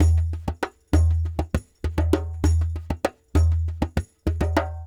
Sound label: Lg Dumbek